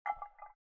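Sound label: elements water dabble stir glass bowl long; smartsoundfx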